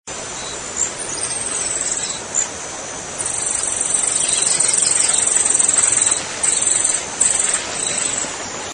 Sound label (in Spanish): picaflor bronceado, voz